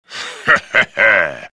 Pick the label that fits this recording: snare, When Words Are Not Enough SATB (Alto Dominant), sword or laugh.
laugh